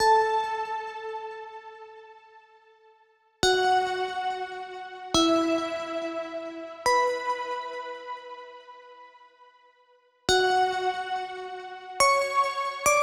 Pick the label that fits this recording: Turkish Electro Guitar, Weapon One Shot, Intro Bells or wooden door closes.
Intro Bells